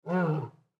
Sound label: Lion Moan; Big Cats; Animal